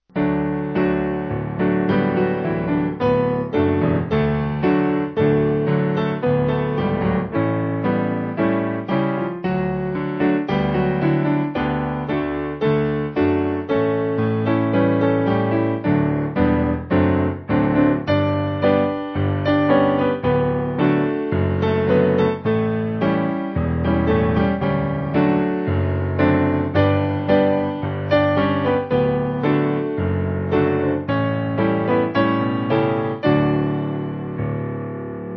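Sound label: Piano, church music backing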